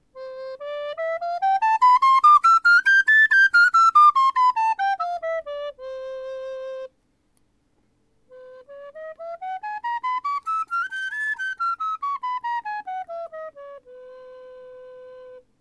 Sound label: mute, recorders